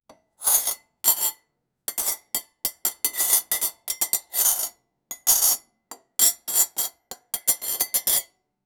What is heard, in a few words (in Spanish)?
cucharas y tenedores sona, percussive